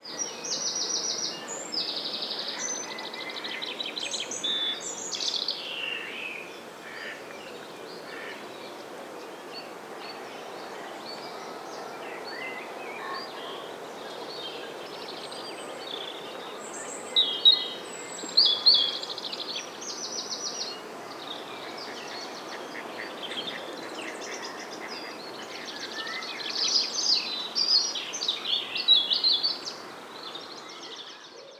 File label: British Countryside Birdsong